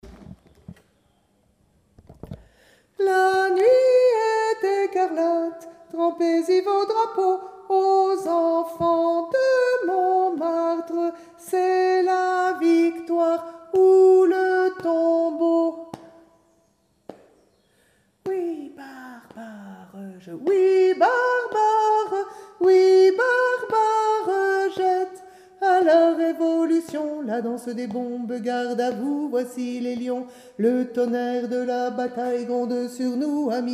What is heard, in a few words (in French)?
danse bombes alti couplet